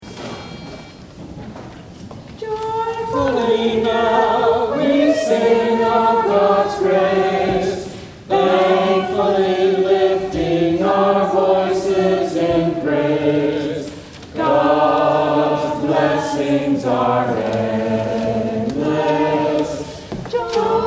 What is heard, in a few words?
Family Choir